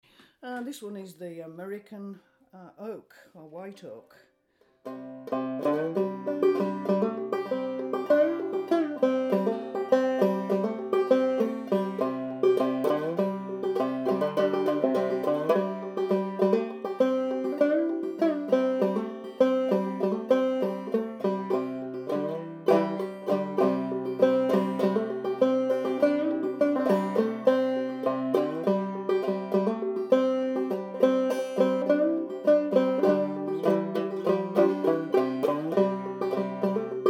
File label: fretless, banjo; reilly; usa white oak